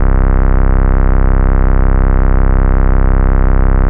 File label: Ultimate Bass Root C; Vengeance Samples